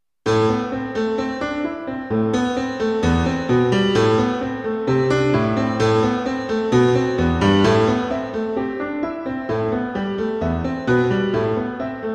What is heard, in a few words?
Pig Mask Piano Medley